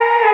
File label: vocal flow Abm; SD AURORA Sample Pack; Slate